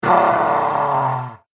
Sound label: Scream Demon; Monster Sound Effects; horror